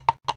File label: steps up; cube ninja